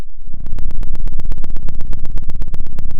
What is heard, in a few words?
pop, Sound of an engine revving